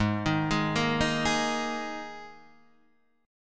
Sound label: G sharp Suspended 2nd chord, down